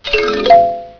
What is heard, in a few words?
xylophon